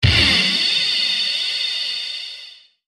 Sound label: element ice; maoudamashii